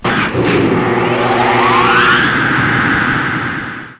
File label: boiler start